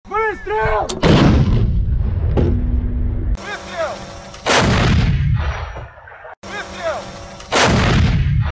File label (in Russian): artiller